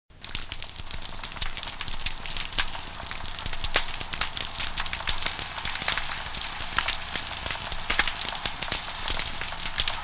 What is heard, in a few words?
snap